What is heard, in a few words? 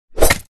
scratch; The Monster Plane; kolmods melee